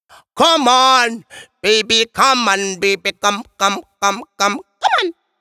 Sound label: Come On, сэмпл вокала регги